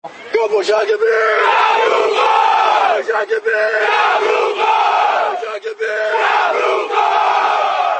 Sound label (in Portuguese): grito do campos rugby